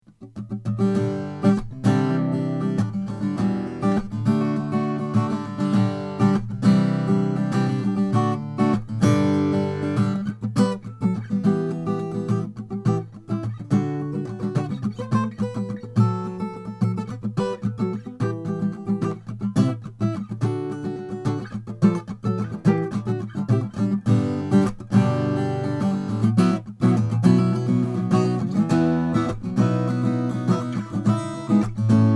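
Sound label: Taylor Liberty Tree acoustic guitar; tulip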